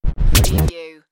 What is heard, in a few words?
Turntable Scratching